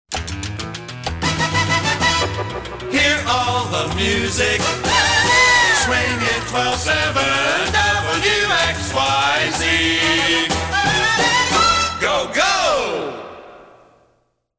Here All The Music Swinging W X Y Z

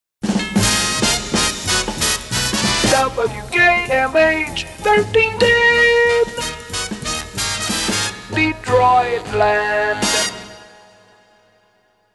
Radio Jingles; WKMH

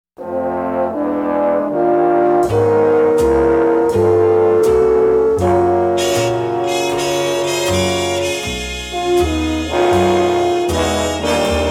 Nice Horns And Wixie; Pams

